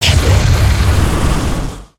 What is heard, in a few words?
Maelstrom Breath; Sorcerer